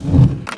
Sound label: wooden Tap Click; gameTable